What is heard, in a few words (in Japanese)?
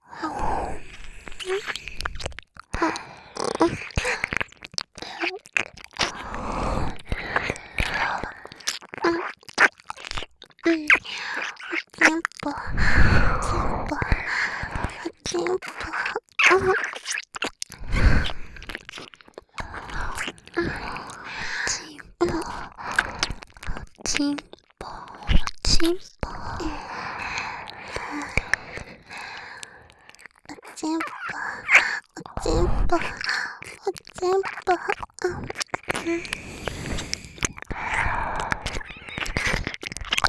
耳舐め; お屋敷メイドのハーレム淫語ヘヴン 気が済むまで耳舐め淫語を堪能できるバイノーラルオナサポ音声